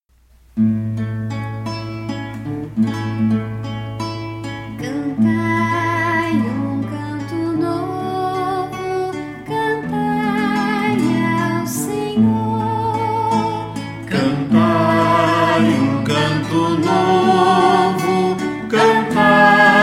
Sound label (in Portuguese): cantai um canto novo